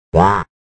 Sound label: quack